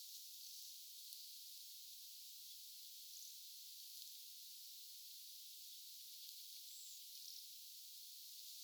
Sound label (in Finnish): kolme eri savyista kulorastaan aanta yksi punakylkirastaslinnun aani